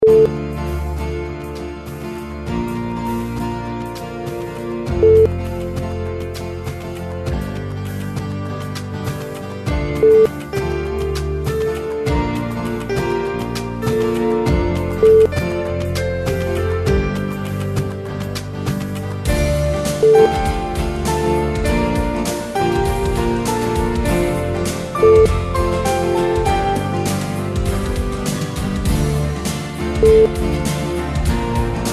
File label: Guitar Parade